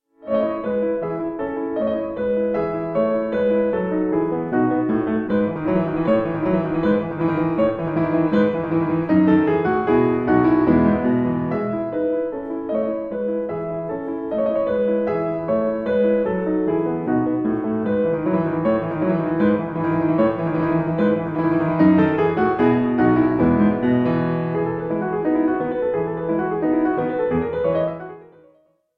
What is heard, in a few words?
suite no5 in e major hwv430 for keyboard